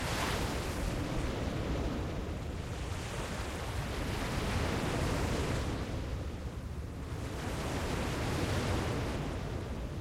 Water Ambience; Lethal Company

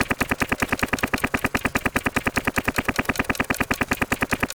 Platform Move Across; Among Us

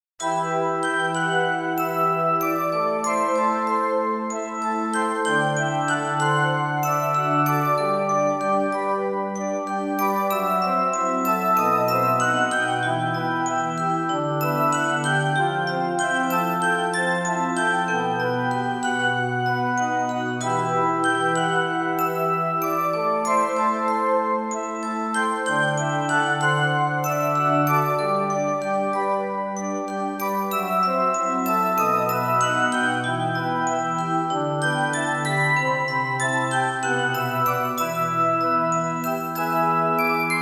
my saint (organ)

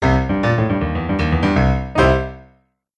Victory!; snoopy flying ace

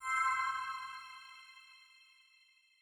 Retry Jingle; Friday Night Funkin' Doki Doki Takeover OST

